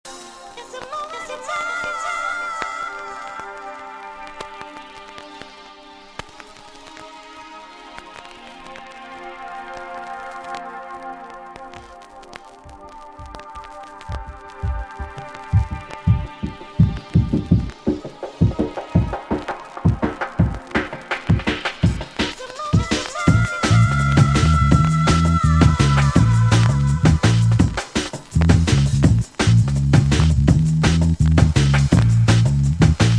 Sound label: dnb